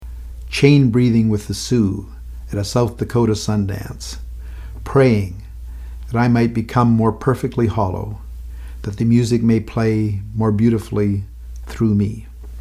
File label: Eagle Bone Whistle; Chain breathing with the Sioux at a South Dakota sun dance